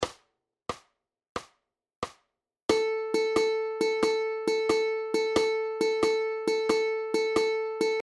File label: Swung 8th Notes